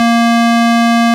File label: ORG VIP Cl 8'A#3, Farfisa Clar; Farfisa Clar DBar